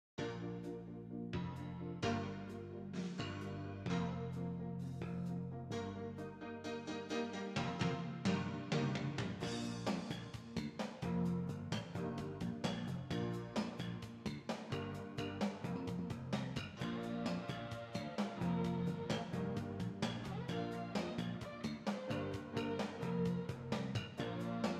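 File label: Main menu ambience Commercial Diegetic music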